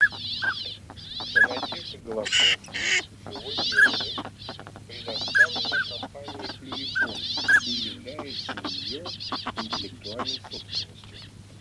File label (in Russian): Шилохвость Codone; plurifon